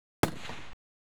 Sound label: fight